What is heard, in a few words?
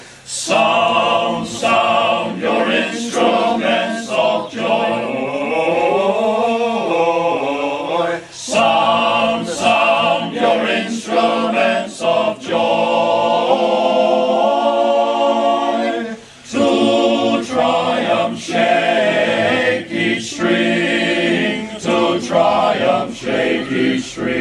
choir